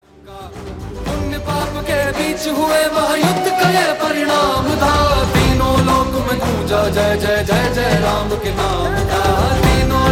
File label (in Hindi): Jai Jai Shree Ram Ringtone Ram Bhajan